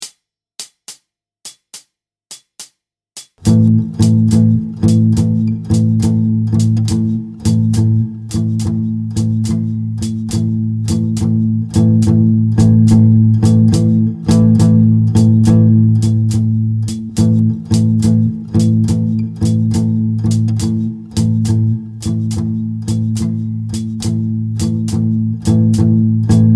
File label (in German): Zusammenspiel ohne Leadgitarre